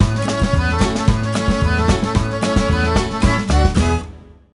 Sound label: Sting Level Bonus